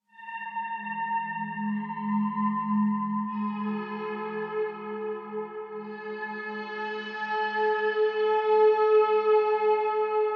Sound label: Horror Metal Scrape Ambience; Scary Soundscape Sound Effects; Scary Soundscapes (Sound Effects:Horror)